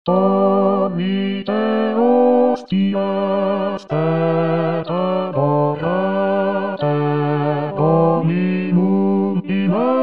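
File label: bass; Tollite hostias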